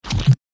Hit Ick; bubble-guppies; bubble-scrubbies

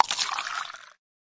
Juice Collect; bubble-guppies; bubble-scrubbies

